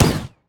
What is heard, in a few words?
phosphorus bomb; Corki